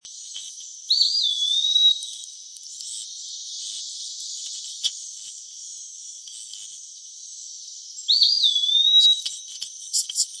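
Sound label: Eastern Wood-Pewee song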